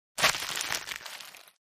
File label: Foliage Impact Hard; hits & impacts